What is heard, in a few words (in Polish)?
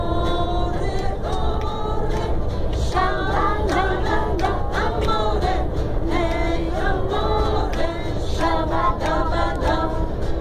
autobus